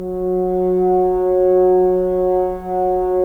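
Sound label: Solo French Horn, Brass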